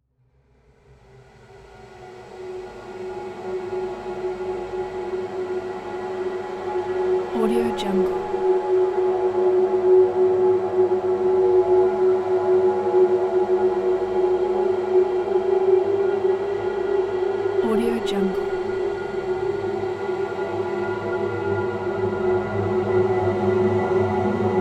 horror texture; cook-sfx

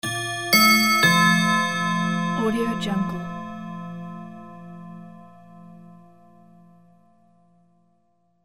clock

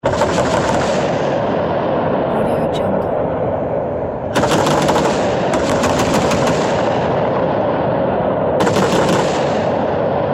big machine gun rapid fire

